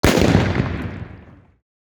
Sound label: Firecracker Explosion; SFX; IRL Recorded